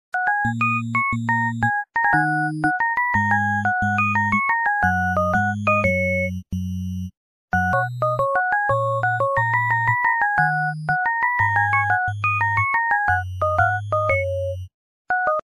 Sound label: 8bit intro